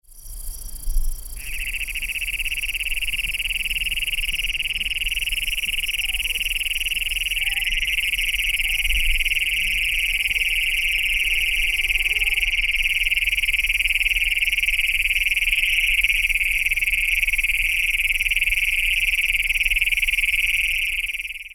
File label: bcognatus call short